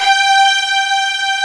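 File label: pop strings G4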